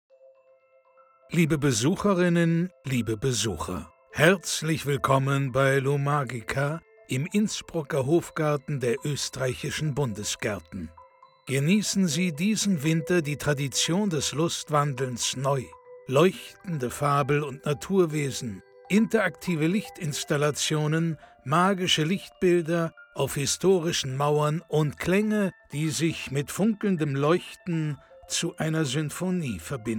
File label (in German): Mallets 3D Begruessung; Lumagica